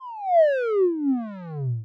falling; anime

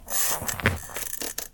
sitting on a chair

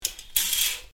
curtain

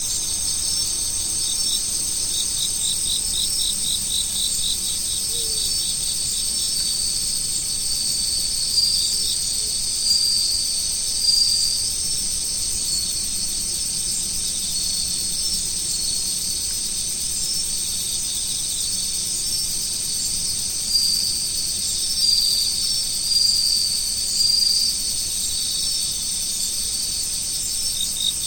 autumn insects; nature

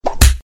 jab; attack

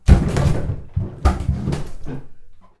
tumbling in a bath; horror

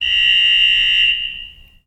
basketball buzzer

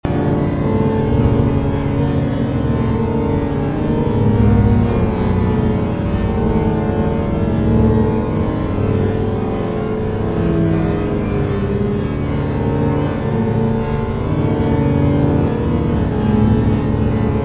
ghosts; horror

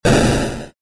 game explosion; arms

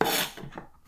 moving kitchen knife; cooking

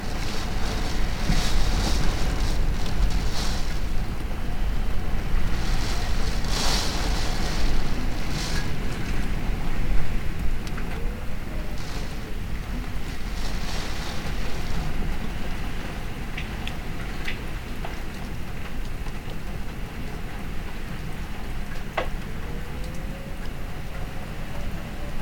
storm and window; nature

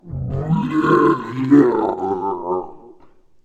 devil groan; monster

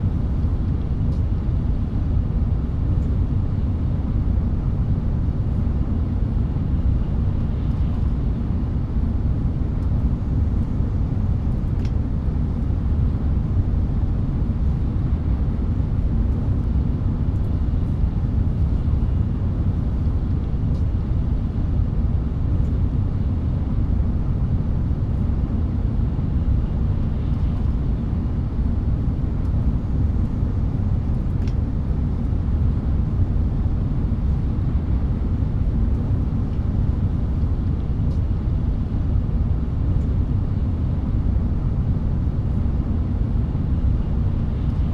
Shinkansen

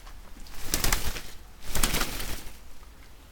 umbrella raindrops

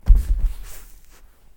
sitting on the tatami; jidaigeki

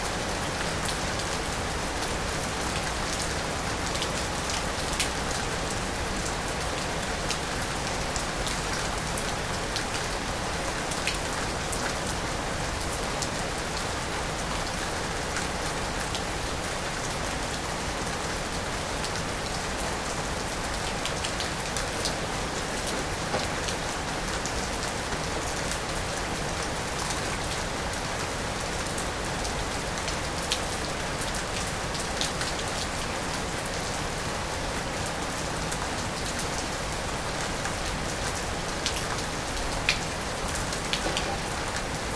rain building

